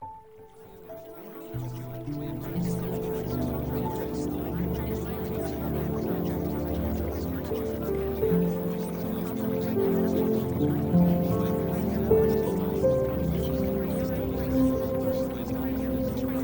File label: Relaxation Music; Premium Subliminals; Emotional Mastery